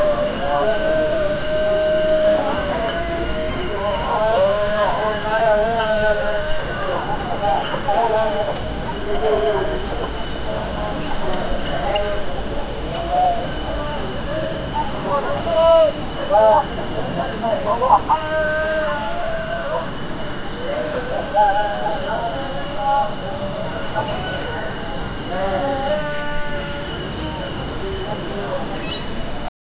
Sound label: a busy middle eastern mar